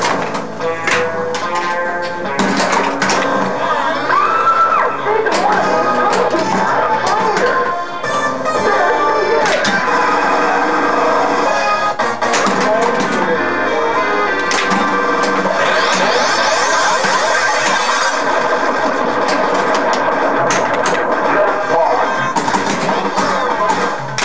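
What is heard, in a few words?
Multiball started / jackpot awarded, pinball; Creature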